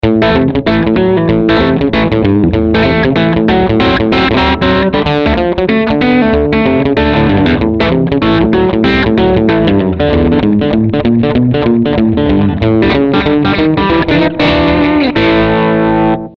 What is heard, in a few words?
THR10C Class A